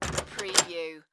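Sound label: Door Hotel Handle Turn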